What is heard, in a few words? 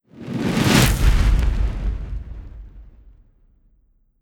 Infernal Flame